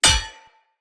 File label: Hammer Impact Parry; Weapon Impacts; Player; Combat; Tuvalkane Hammer